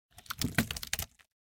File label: Skeletons; Blind Quest - The Frost Demon